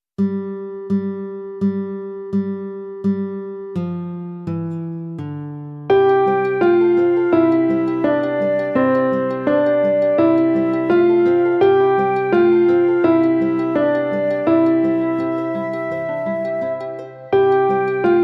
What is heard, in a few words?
Mister Bluebird Slow